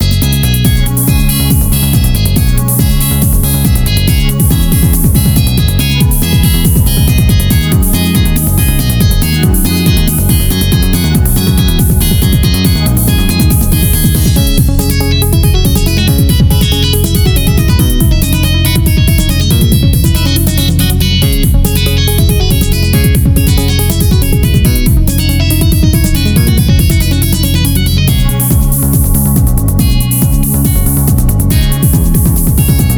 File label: disco jam